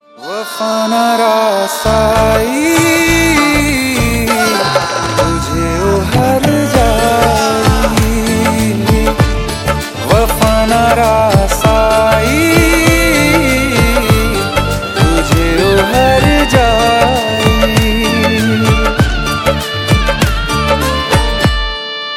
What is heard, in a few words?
hindi ringtone